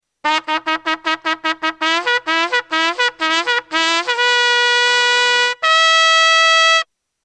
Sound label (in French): instruments cor de poste